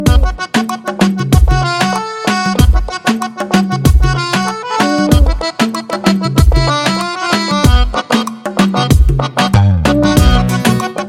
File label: mouth organ